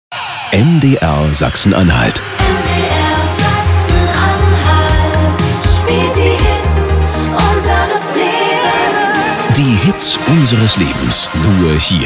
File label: Transition medium to medium